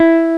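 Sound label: Guitar (jazz) E2; X-Wing; X-Wing Patches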